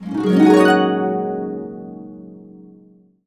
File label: Complete Harps; USB Soundscan vol.62; AKAI